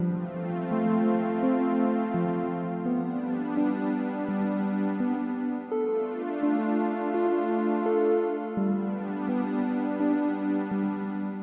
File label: stem chords, music loop; timber